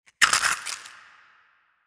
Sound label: small legs up down; Stellaris; biogenesis; ships